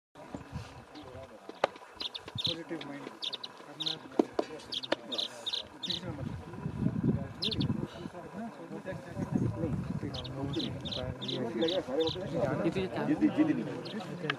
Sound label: Accentors; صعوه